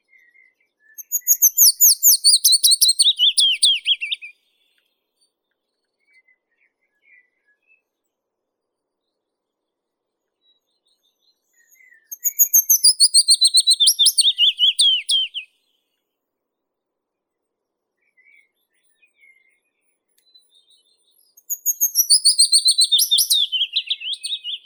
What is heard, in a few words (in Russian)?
penochka vesnichka phylloscopus trochilus